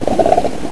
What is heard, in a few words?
Splinter Splitting; Barrack Unity